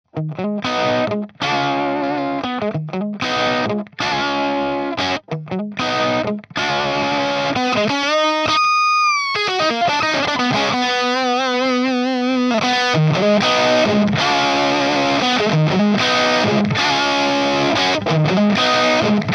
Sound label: Rhythm Pushed; Mercury2CP